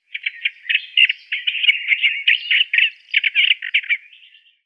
Olivenspoetter Hippolais olivetorum

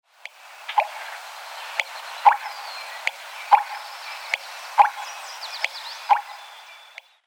Nordamerikanische Rohrdommel Botaurus lentiginosus

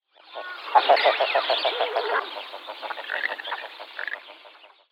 Zwergsaeger Mergellus albellus; Vogelstimmen

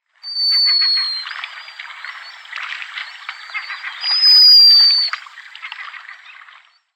Gryllteiste Cepphus grylle; Vogelstimmen

